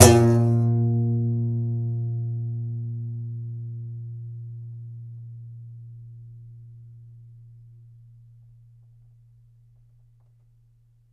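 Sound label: Contrabass Balalaika, Plucked Strings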